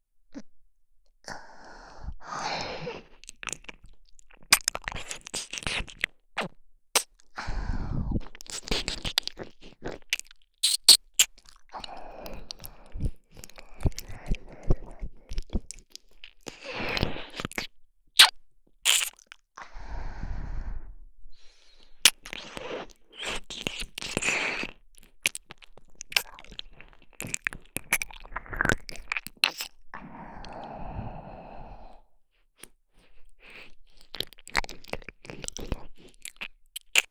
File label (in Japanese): 耳舐めのみ(右耳）; 癒らしママの耳舐め早漏訓練